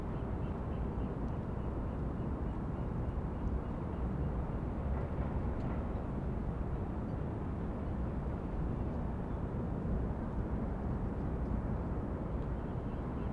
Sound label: docks; soundscapes